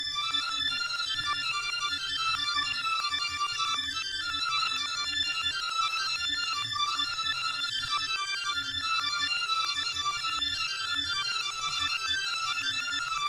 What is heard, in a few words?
Ethereal Industrial Violin